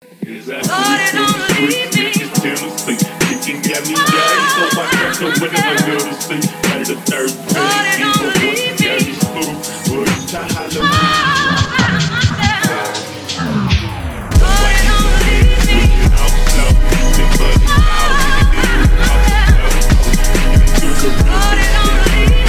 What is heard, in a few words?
PHONK